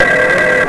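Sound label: BLUE ALERT; sigseries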